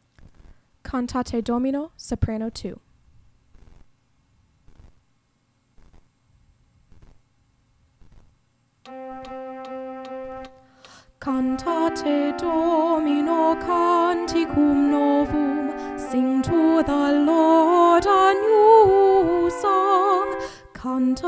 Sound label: cantate domino soprano